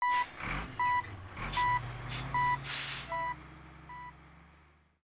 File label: REV BEEP; zones commercial; PC Gamer IT CD 40